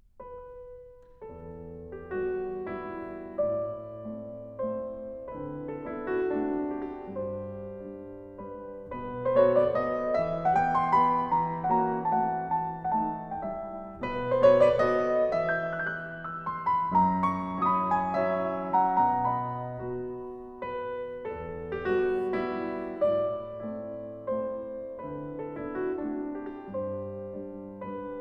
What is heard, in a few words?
Mazurka in E minor, Piano